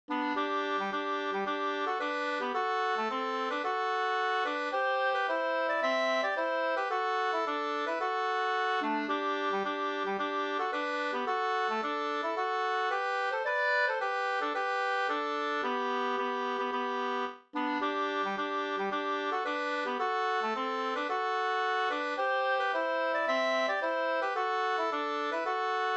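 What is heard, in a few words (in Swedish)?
brudmarsch Bruralat fr Valdres, Norway 2 stämmor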